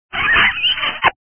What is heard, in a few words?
kiss